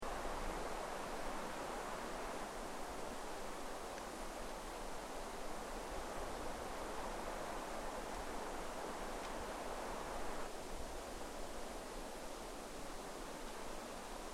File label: Ambience Sannohe Forest Winter Air, Winter in Japan; Forests And Jungle